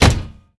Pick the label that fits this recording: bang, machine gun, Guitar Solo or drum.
bang